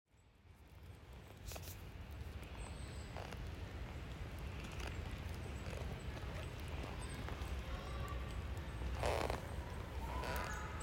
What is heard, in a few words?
Pacific Spirit Regional Park